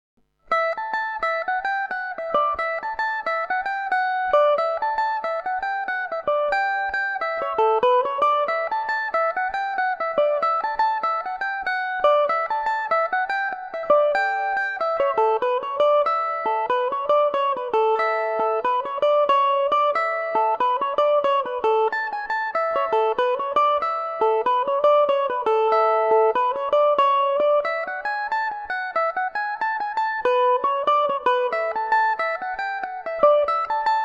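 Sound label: A mixolydian revelation, Irish mandolin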